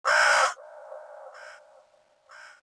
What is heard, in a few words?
winter crow; World of Tanks Blitz; ambient medvedkovo